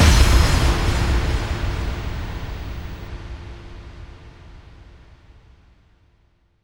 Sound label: Bassdrums FX, FX Kicks; Vengeance Samples